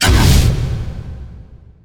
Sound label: adaptive strike; weapons; dota; morphling